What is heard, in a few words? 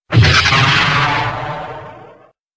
blaster sound effect; star wars; stardestroyer